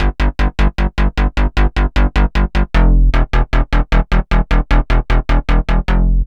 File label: Psycho Bass; Dr. Fink's Funk Factory